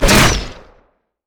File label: bola strike; rengar